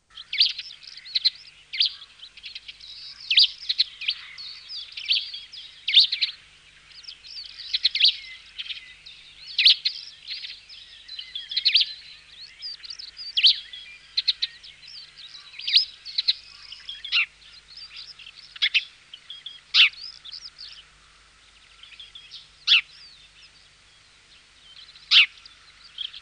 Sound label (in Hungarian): Mezei verйb; Madarak